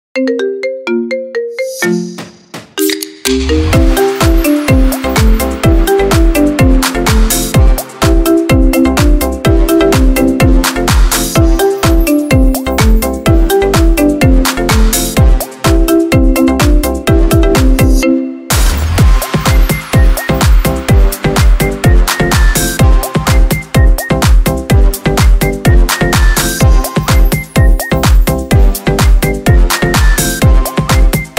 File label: marimba ringtone